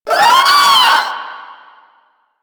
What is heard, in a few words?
Proto Infested vocal short attack; Warframe; InfestedNinetyNine